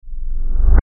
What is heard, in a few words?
CRESCENDO DEEP, Crescendo Deep Abrupt Fast; Production Element Imaging Element Accent Transition